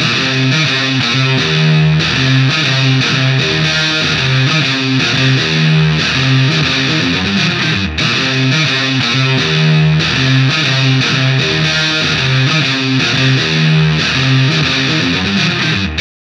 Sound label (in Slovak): gitara